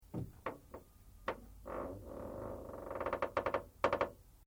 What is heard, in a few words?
METAL CREAK LIGHT; Materials